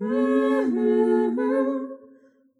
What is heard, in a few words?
Soft Soulfull Harmony